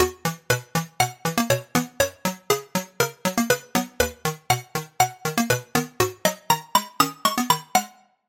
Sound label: Key